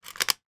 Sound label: toy car race track launcher mechanism retract